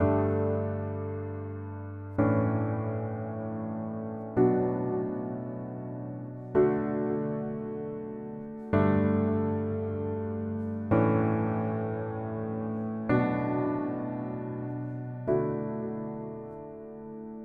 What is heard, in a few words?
Piano Epic pop Bm 110; Sample Banks; Melody; Keyboards